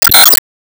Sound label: Enemy Coral Active Shot